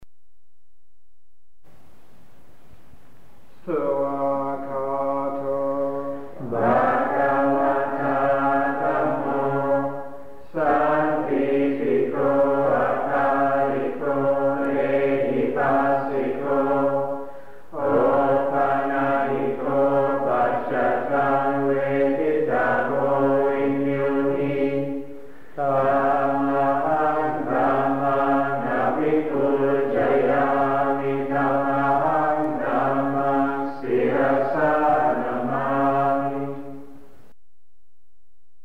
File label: chanting